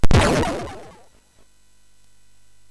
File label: SFX, MOS6581; Narco Police; hvsc